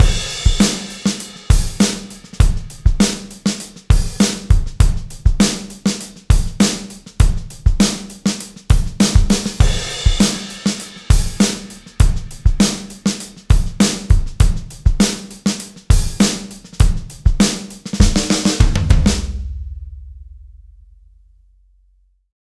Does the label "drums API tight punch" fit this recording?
Yes